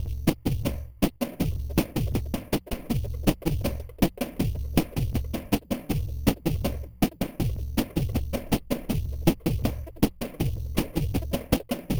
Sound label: Electronic Percussion